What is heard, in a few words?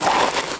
Sand footstep